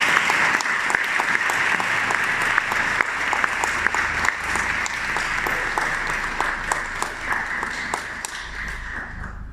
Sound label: Clapping Reverb